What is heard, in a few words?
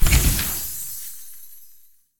win Symbol Flash End; fairyhansel